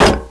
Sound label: fuel tank large, destroy